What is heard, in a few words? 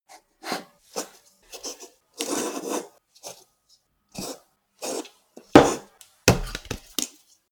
cup handle